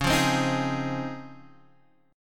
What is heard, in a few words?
Db Major 9th, Guitar, Open D